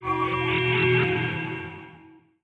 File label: shielded planet; ambient; Stellaris